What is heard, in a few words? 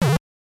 Block; super mario bros. 3 1988 nes